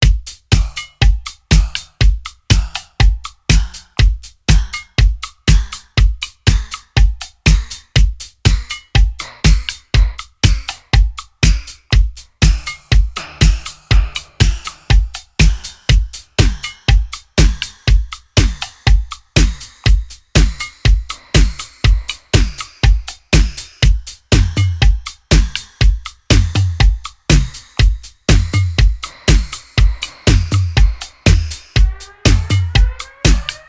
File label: Dub